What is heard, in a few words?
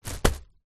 food wrapped loaf of bread down on surface; zapsplat